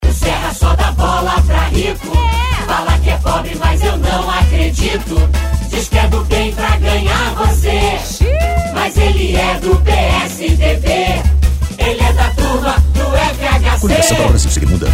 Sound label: JINGLE